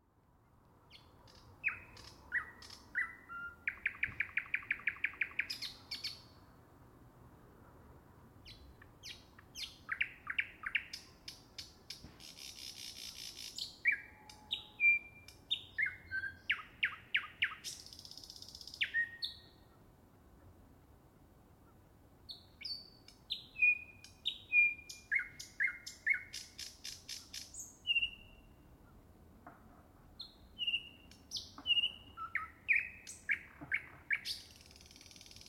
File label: Finnish birdsong at 2am